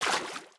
water, step

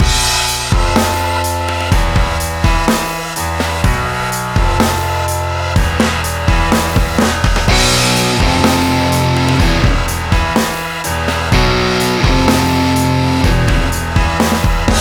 theme, music combat

